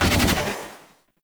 Gun Beam End; Warframe; Weapons; Thano Tech Arch Gun

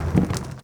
Pylon Plastic Roll

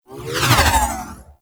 Ball Projectile By; Warframe; Tenno Ball; Missions

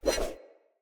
Dagger Blade Flip Fill; Weapons; Player; Swords; Fey

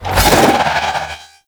Melee Spin; Warframe; Sentients; Sentient Trooper

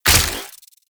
Derelict Prime Shot; Infested; Cernos; Weapons

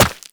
Run Rock, Footsteps; Warframe; Characters; Operator

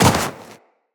Run Snow, Footsteps; Characters; Tenno

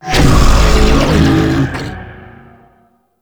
Puzzle Beam Start; Warframe; Duviri Murmur; Medusa Puzzle

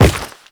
Step Rock, Footsteps; Characters; Warframe; The New War; Kahl

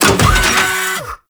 Thumper Bot Leg Up; Warframe; Grineer; Movement; Legs Up

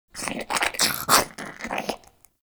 Close Goop Release; Ambience; Warframe; Infested Eris

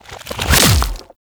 Octopede Limb Attach, Enemies; Warframe; ManInTheWall

